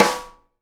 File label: SNARE RIM, Live Snares; E-MU Producer Series Vol. 8 – Platinum Phatt (CD 1)